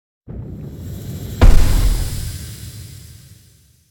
Statue Appear

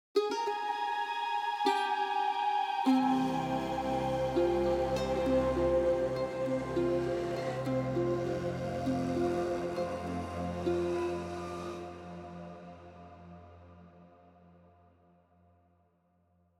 Sting Arctic Night

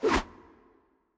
Flying Dagger; Legend of Keepers

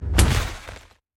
Earth Punch; EarthPunch rr4

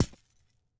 Roller Hit Wall; gamerip

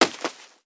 Monkey

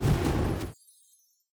Ice Thrower

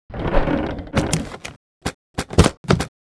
Cannon Die; Knight Online

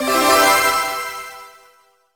Level Up!; Spectrobe

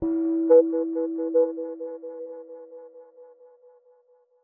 Special Photo Impossible Triangle Plong; Sx Special-Photo Impossible-Triangle Plong 01

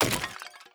Pot Death

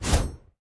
Attack Heavy

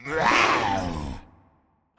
Spirit Death VO

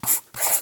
pen

